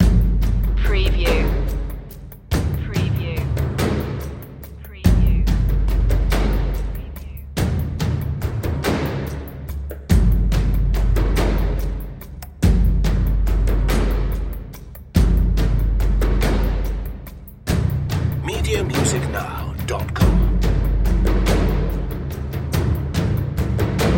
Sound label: drums only